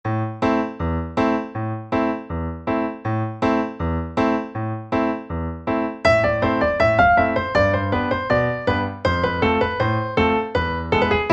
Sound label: Polka